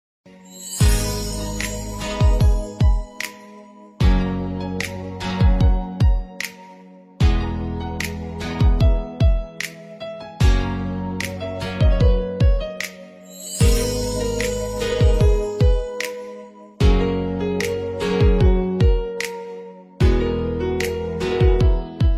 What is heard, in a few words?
Romantic Instrumental Ringtone; Bollywood